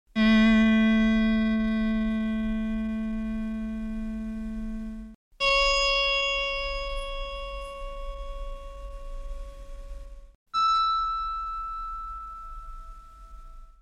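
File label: electric harpsichord, chamberlin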